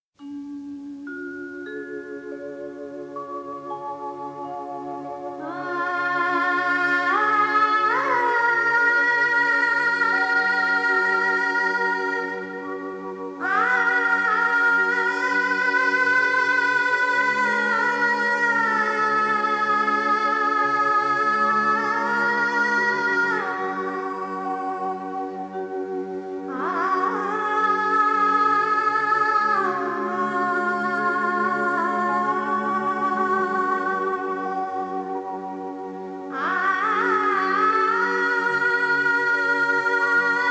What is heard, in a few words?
Alap; drishti 1990